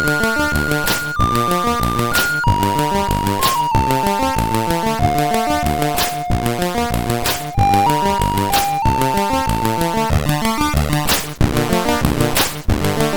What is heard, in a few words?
Old Favourites, sid CSG8580R5